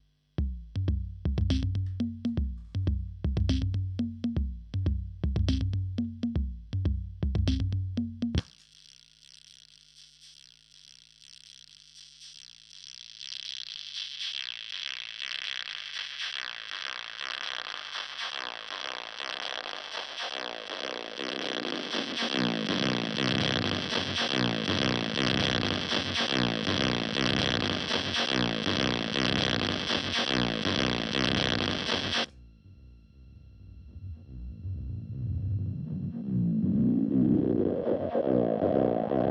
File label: Evil Filter Drum Loop